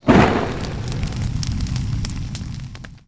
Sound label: Fwoosh